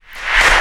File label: FX CLAP; MIX FX; E-Lab; X Static Goldmine 2; akai